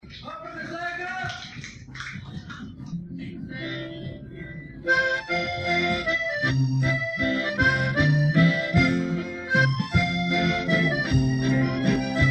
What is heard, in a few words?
Old Time Medly Polka Mazurka; Harry McQueen